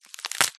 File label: BONE BREAK SINGLE; PMSFX